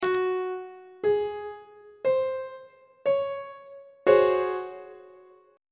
c sharp major 7th suspended 4th 1st inversion on treble clef